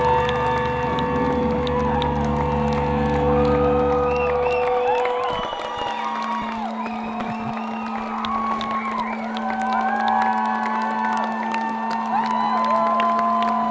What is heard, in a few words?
applause